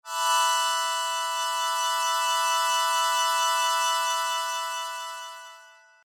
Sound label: Sho